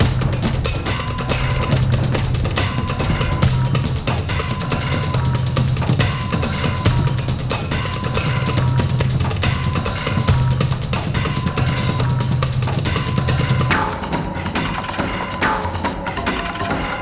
Boss Fight Remix; The Golden Compass; Spy Fly Flame Thrower

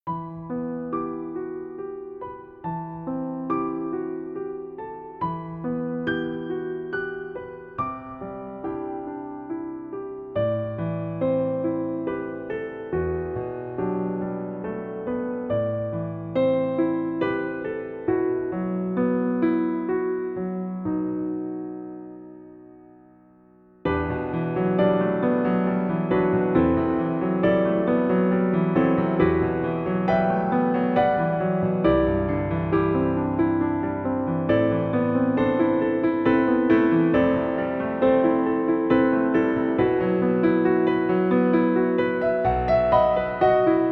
Theme Piano version; edge of eternity

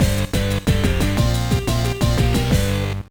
Jingle, gba; Backyard Baseball 2007

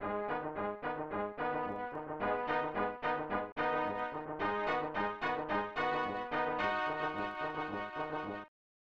Unused Jingle; Ren Stimpy Buckeroo; snes

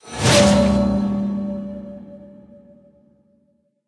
Shield-Complete; Frog Infuser; Kingdom Rush Vengeance; tower defense

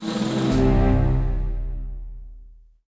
Level End 2 Stars; Shadowhand; macos windows gamerip 2017

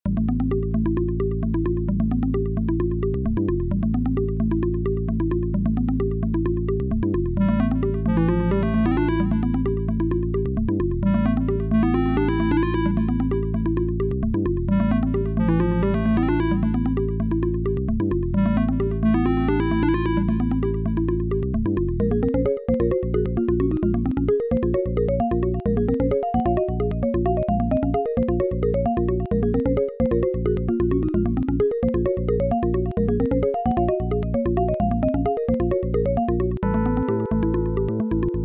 Toy Factory, Adlib; Lollypop; MS-DOS

